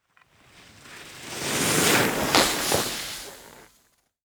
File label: Ski; iMovie '08 Sound Effects